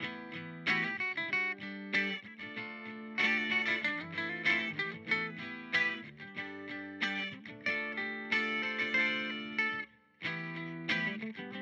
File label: Boss GT-1000 Core Deluxe Reverb Pur; Vergleichstest